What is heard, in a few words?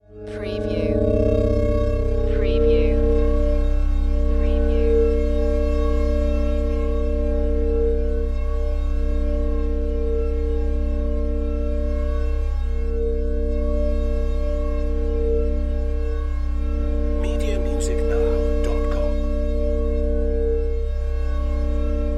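Production Element Bed Synth; Sound Effects Bible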